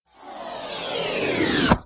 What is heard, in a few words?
wind fall thump